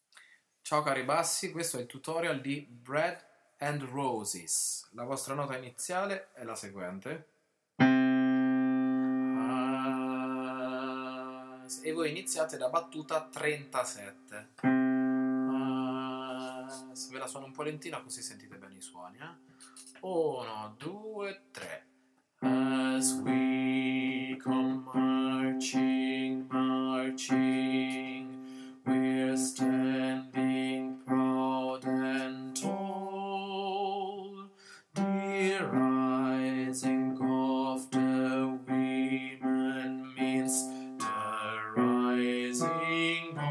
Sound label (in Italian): bassi